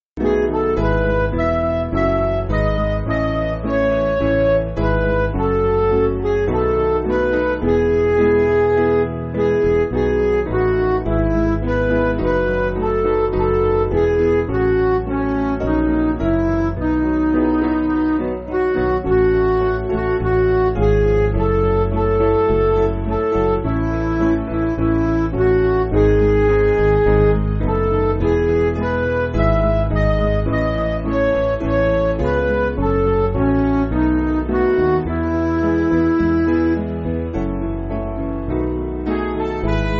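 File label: Music Backing Files for Churches; I Desire O Jesus; Sullivan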